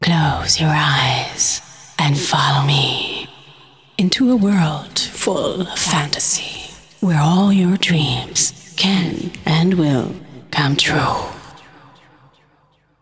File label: vocals; SoundFactory; SMPMANIA